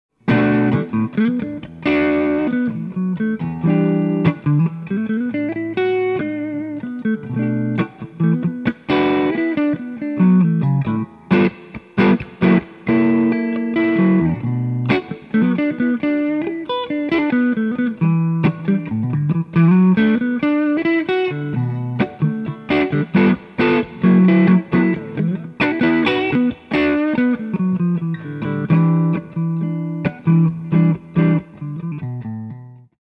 cruch drive, hm-475

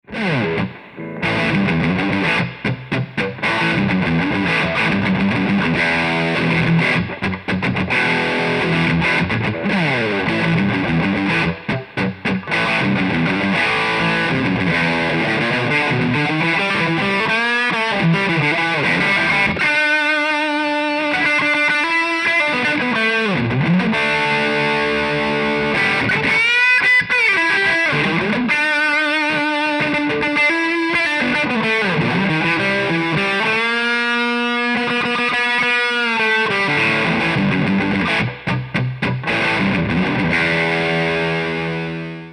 hum

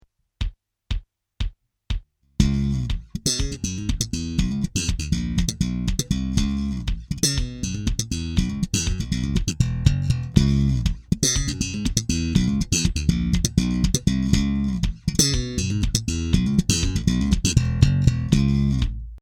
slap

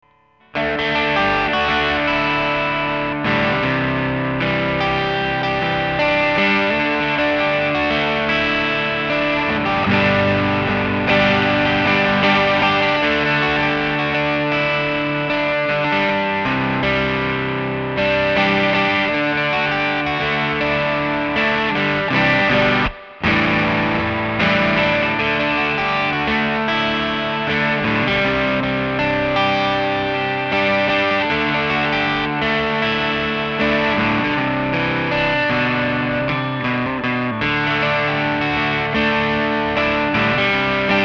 hum

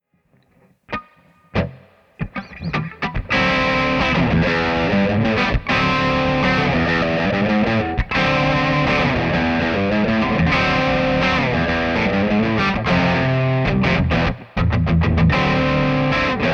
hum rear full; G-Sleek-747

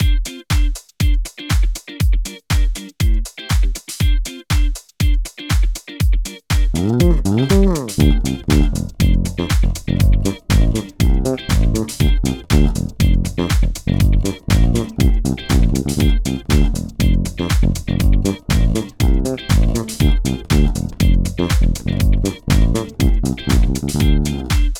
Disco

